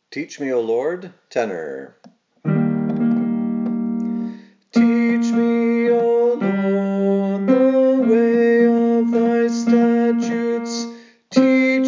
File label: Tenor; Attwood; Teach me